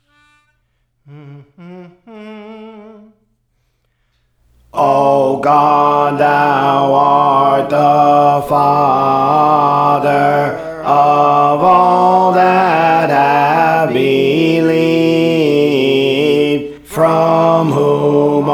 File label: Alto, Songs; Praise For The Lord Training